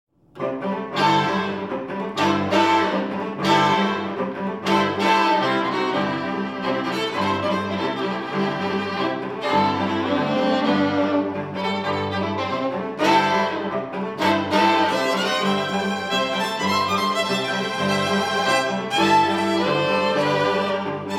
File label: String Quartet, -; Winnipeg Canada